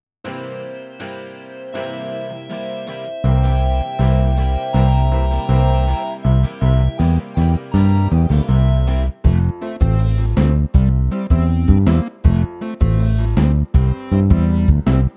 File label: Karaoke